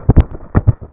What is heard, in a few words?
wolf move